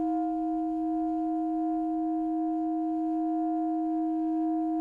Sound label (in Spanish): Multiarmonicos